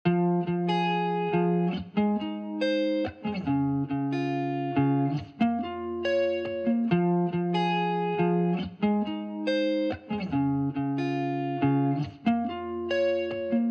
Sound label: Guitar Dry